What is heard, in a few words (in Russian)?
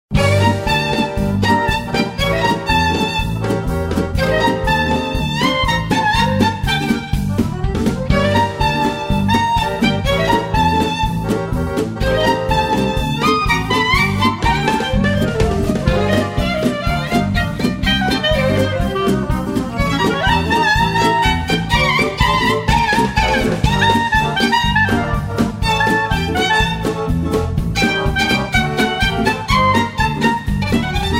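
весёлая мелодия; iz rossiyskogo likvidaciya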